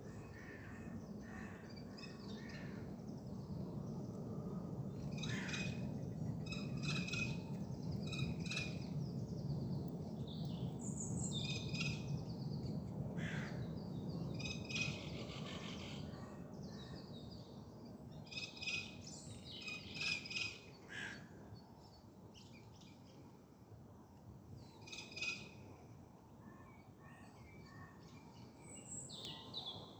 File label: Jays